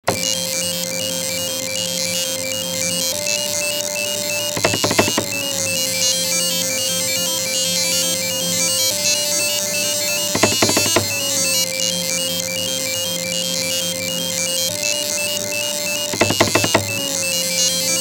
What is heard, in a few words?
printer